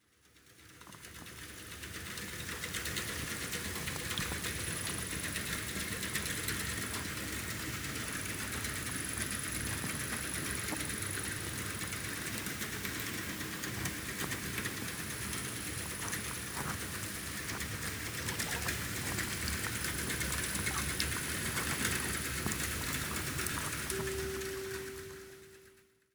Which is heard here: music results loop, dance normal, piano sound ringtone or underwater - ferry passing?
underwater - ferry passing